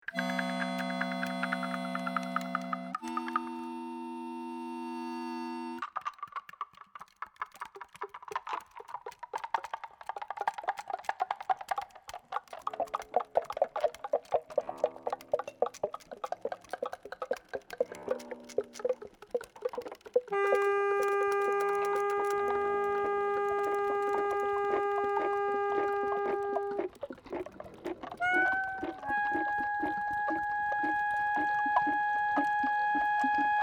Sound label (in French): Accordeon Perdu